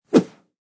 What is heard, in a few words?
Sword swings in air; Combat Swings